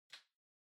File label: Light Switch American Toggle Far Dry Gentle